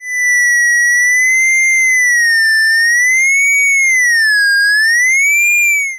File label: clipped polyBLAMP